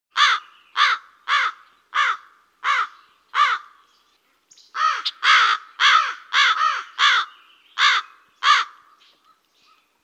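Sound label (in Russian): две вороны вечером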